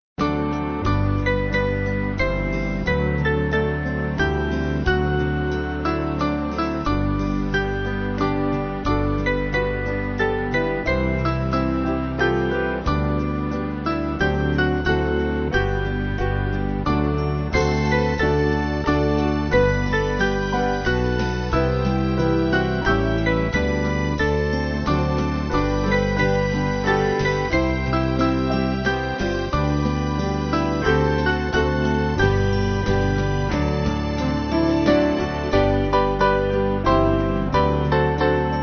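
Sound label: Band, Music Backing Files for Churches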